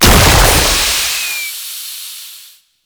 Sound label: Infested Survival Purification Machine Explosion; Warframe; Nineteeen Ninety Nine